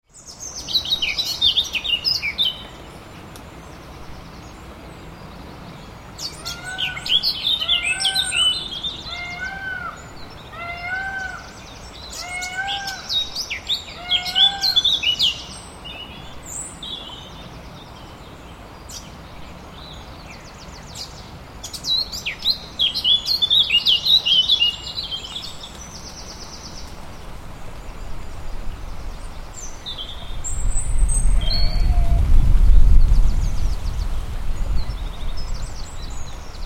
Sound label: churnet birdsong